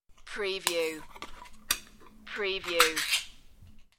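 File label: Open Small Tin Can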